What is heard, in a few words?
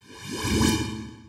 bubble change slide